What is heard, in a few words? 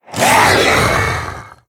Zombie Monster Die; Undead